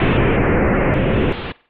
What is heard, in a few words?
Summoned a storm; mother2